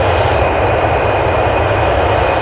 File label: Jet Aircraft Loop